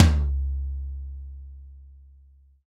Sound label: hi tom drum single hit c sharp key